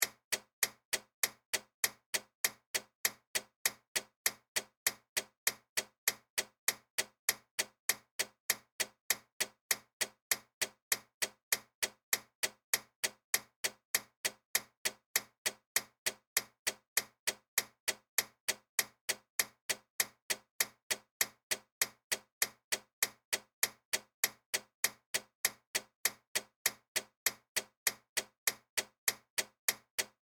Arcade Game Blink, Technology, Arcade, Game, Blinking, Lights, Loop; SoundEffects-BlastWave